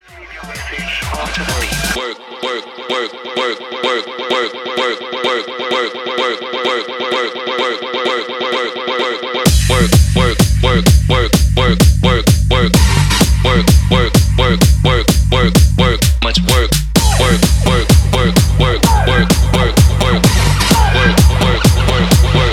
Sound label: Quick Dance Edit 128 BPM